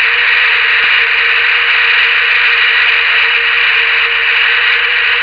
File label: VHF Radio Aurora Audio